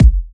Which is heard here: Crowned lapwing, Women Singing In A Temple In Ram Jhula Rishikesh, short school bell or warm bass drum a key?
warm bass drum a key